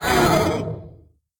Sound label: nightmare hit; Crypt of the NecroDancer